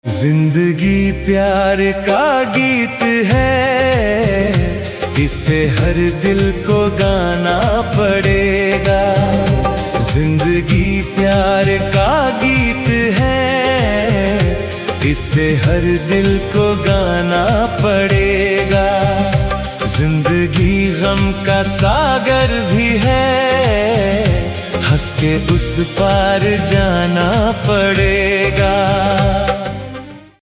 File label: AI Male Ringtone; AI Cover